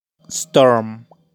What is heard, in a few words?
Storm, weather; Clima